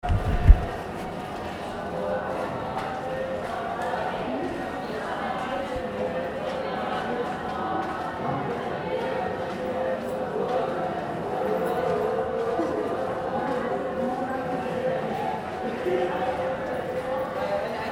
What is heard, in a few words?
Kapan Monks lunch chants; Kathmandu Ambient sounds